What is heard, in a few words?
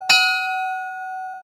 bell chime